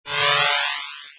Player save

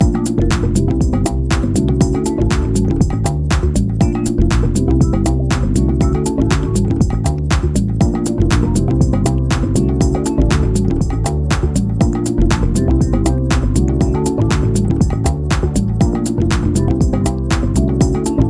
Short Loop; Scrabble Crossword Game; NDS

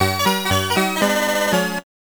Miss

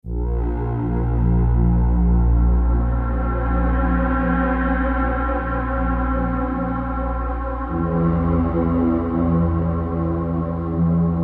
stinger soft

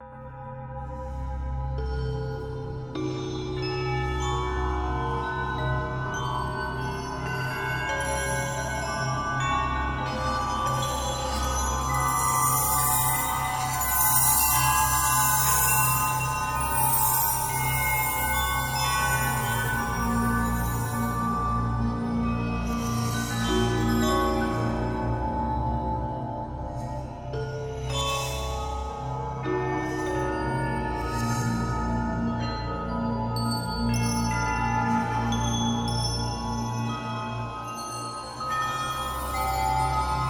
Chaos Chimes

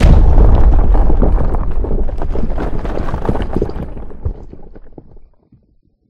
rock avalanche landslide; ProSoundCollection v1 3 MONO; Impacts Smashable; debris

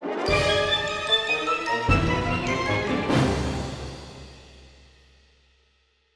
Thrilling Danger Stinger; little big planet playstation vita

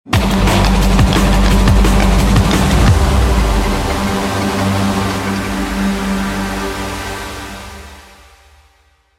Sting; Narco Terror gamerip 2013; Pile Driver 01

